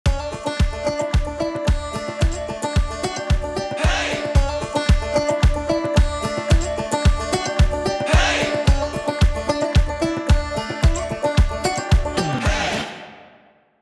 theme music; zoomerang

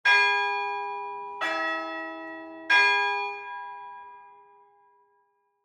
bell toll; mystery-island

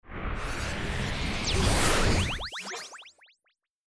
sim station sound effect single; time-lab; teaching

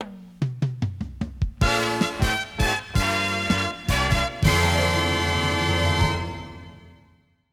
Outro Music; zoomerang

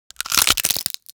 bones cracking; incredible-race

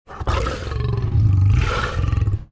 jaguar growl; incredible-race

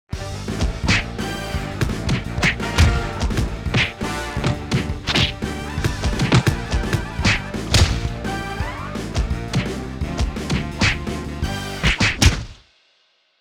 Fight Sounds; ocean-commotion

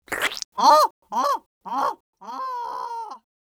goose splat fly away; emerald-crossing

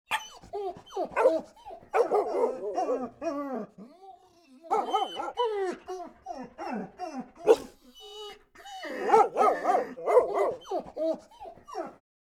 Dogs Whine for Food; operation-arctic

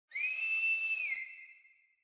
captains whistle; incredible-race

